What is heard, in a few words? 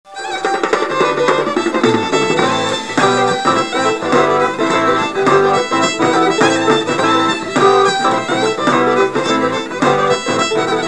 pipes